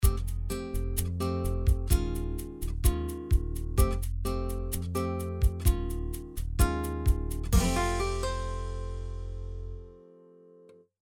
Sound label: Walking Bossa End; Late in the evening, dimmed lights; Mood: Relaxed; BPM: 128